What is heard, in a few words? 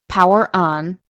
power on